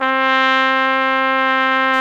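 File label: Solo Brass trumpet; AKG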